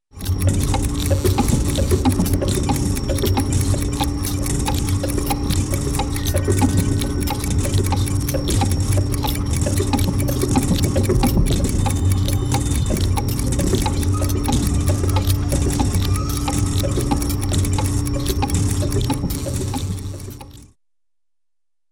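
Huge Clock Interior Ambience with heavy Hum & Mechanisms, cartoon; Hollywood Edge; Cartoon Trax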